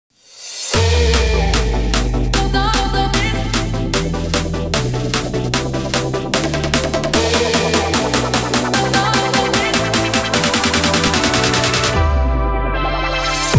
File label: upbeat electronic